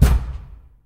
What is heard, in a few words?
wall window; Golden Crayon Sounds